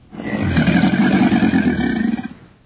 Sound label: crocodile; ambient